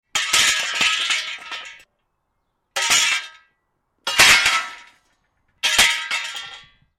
Impact Metal Bars onto Hard Ground, Iron rods dropped; Iron rods dropped onto stone x 4; Tom Hutchings